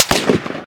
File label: rifle close; plague